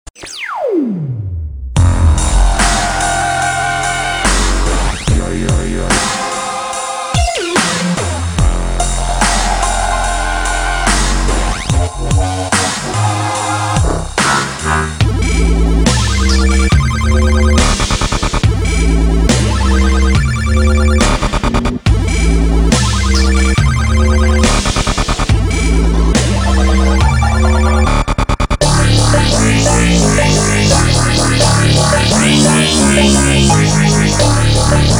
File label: DnB Dubstep NI MASSIVE PRESETS; Samplify